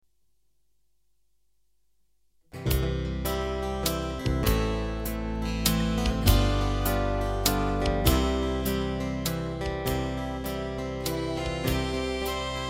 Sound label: C D Backing